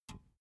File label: big can filled pick up hard